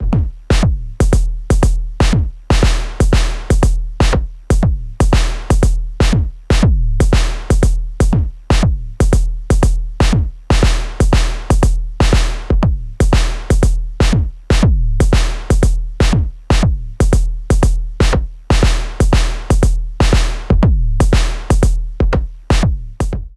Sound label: Transient Plus Sample Select Modulation; Future Retro